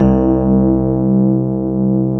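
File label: grand piano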